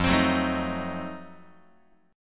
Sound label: F 7th Flat 9th F down Guitar; F7b9 strummed